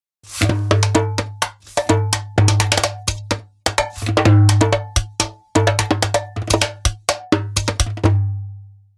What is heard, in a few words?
doumbek slaps naked